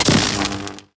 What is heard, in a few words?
portable coil attack